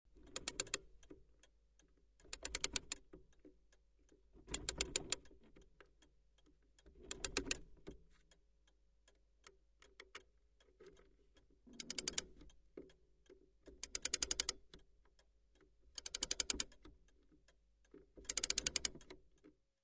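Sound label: Winding a small clock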